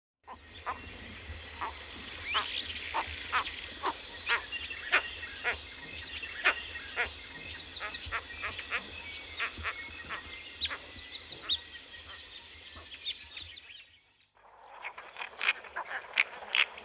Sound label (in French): Héron garde boeufs, oiseaux de Mayotte; oiseaux terrestres; faune de mayotte